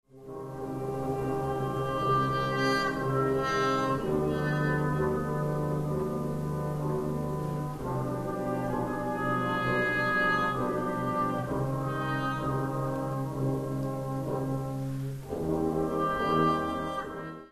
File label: duet